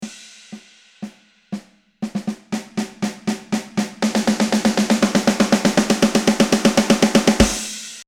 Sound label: Snare Roll